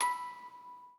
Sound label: musicbox